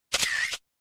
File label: camera